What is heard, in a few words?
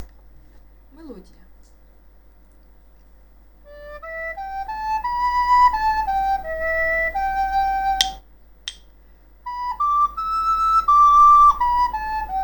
melodia; Мелодія